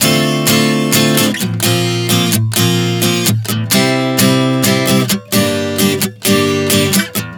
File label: Dm7-Bbsus9-C-G 130 bpm, acoustic guitar chord progressions